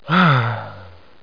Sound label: SIGH; Simon and Schuster Interactive